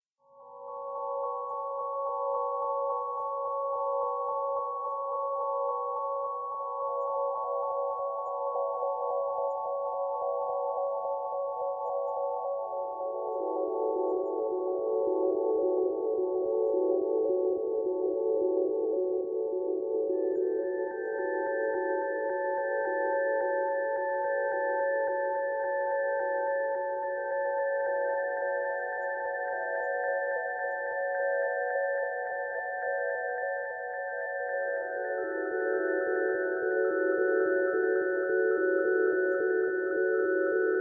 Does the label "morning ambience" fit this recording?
No